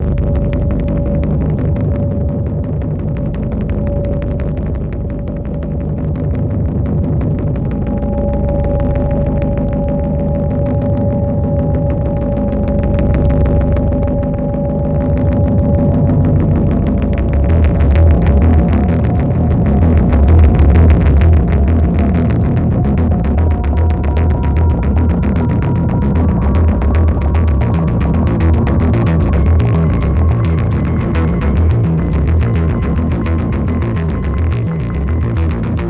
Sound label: bass wave